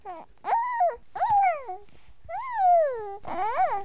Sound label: baby; Vitra